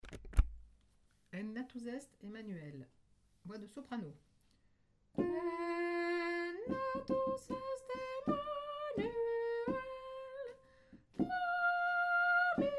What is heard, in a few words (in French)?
en natus est soprano; nouveaux noels; chants noel